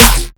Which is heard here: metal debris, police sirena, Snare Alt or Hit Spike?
Snare Alt